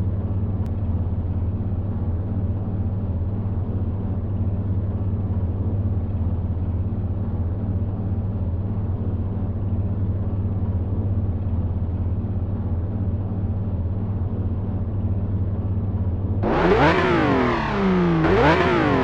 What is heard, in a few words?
idle, vehicles cars; lwcars; spy c8